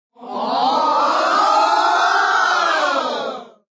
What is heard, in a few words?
Yell Multi Oh, Female yell; Yelling; VoiceBox